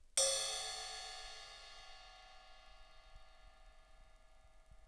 crash ping; A custom crash 14; cymbales

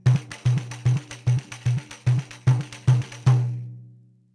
Frigideira

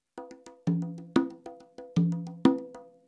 tumbao, congas

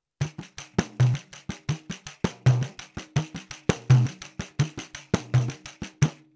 Surdo

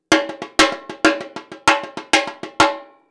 Repinique samba reggae

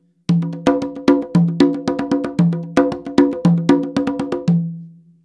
meringue, congas

